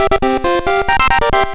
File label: Bonus Perfect; Score perfect in a Bonus Round; zelda